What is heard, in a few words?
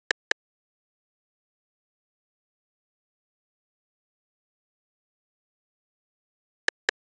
Drum Loop - 146 BPM Percussion; Drum Loops; Loop Stems; Cymatics; Mystery Pack Vol 4; Tighten Drum Loop